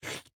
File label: eat; factorio 2.0.55